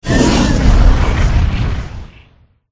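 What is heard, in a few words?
boss squid death; kalmar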